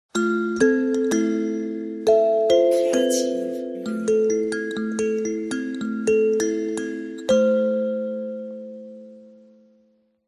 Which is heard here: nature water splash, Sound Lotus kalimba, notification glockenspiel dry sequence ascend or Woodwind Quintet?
Sound Lotus kalimba